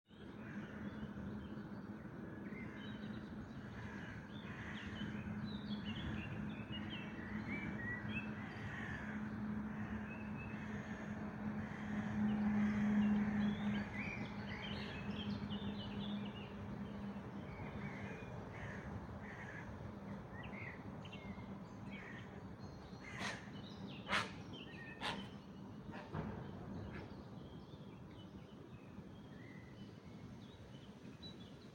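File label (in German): Dawn Chorus, Vogelgesänge vor Sonnenaufgang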